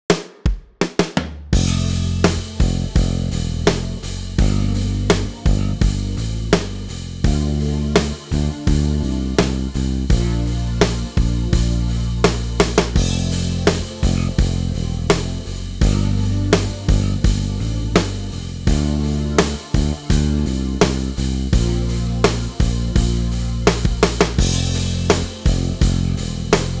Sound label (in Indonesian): chord drill iringan pop improve, bass